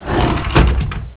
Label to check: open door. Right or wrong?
Right